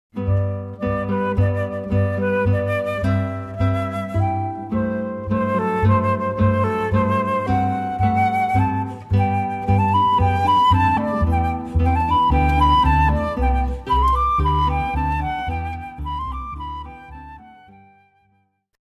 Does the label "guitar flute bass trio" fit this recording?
Yes